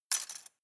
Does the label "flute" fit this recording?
No